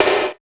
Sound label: Elec Snare; Snares